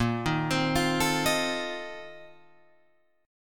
A sharp Major 7th Suspended 2nd Suspended 4th A sharp Guitar Open F; A#M7sus2sus4; arpeggiated; JGuitar